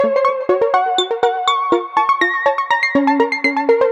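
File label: 122 BPM Sequence F major D minor Tonic C; innovative sequences 1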